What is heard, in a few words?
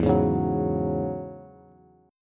E 6th Add 9th E down Bass; E6add9 strummed; JGuitar